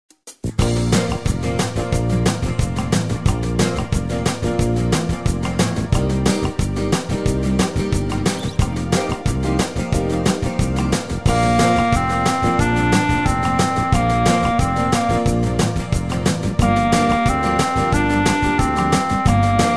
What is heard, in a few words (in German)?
klarinettentaxi